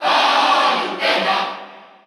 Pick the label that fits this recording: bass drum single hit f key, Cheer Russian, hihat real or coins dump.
Cheer Russian